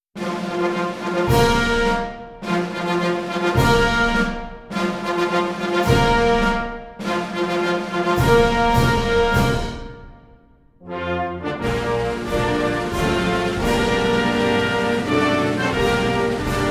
four ruffles and flourishes into the star spangled banner; Marine Corps Birthday Ball; National Anthem, "The Star-Spangled Banner" (John Stafford Smith, Francis Scott Key)